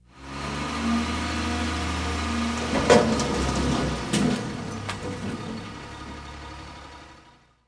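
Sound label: garbage truck; commercial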